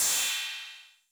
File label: Cym B 808 Sat Decay A, Cymbal, Multi Saturated